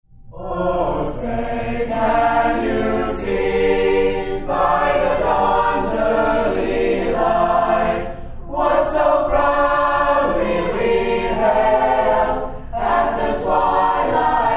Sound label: star spangled banner